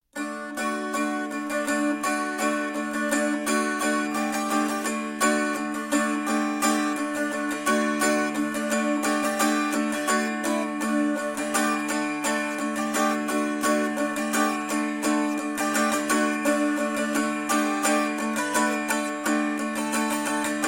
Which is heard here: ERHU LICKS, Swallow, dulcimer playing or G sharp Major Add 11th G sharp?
dulcimer playing